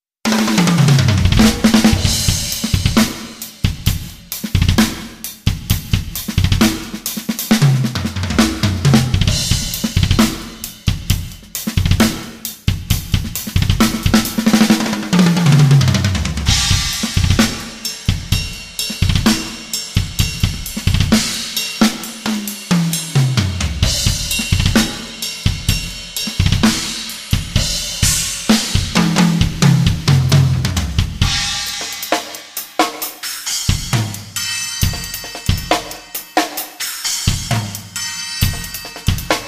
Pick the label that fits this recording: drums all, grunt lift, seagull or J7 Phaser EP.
drums all